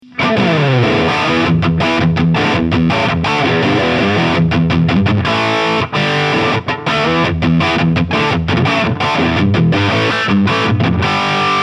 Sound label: Friedman T 7020 Lead Fat